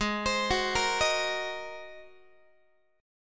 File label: G sharp 9th G sharp Guitar; G#9 arpeggiated; x,16,15,16,14,14; JGuitar